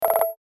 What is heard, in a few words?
Digital interface short scroll menu ui